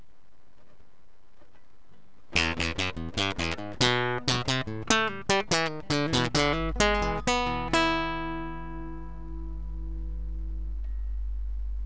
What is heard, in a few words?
tug nylon